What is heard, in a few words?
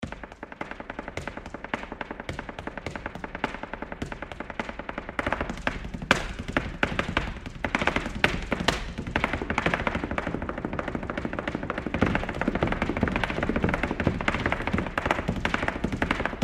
hands and feet